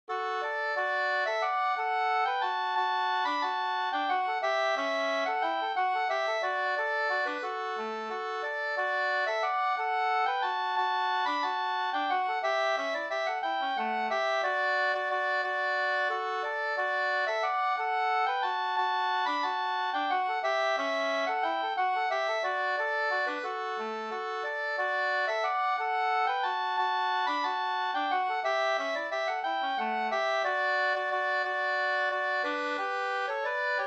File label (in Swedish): vals brudvals